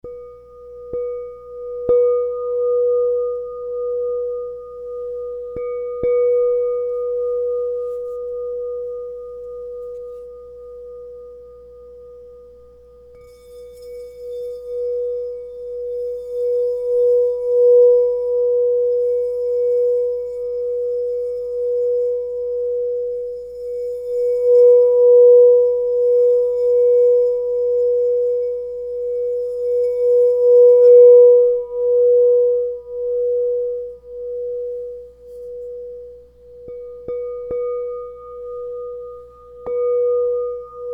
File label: Crystal Tones Singing Bowls